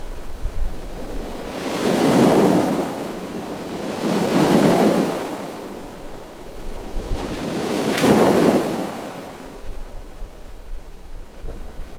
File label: ambience ocean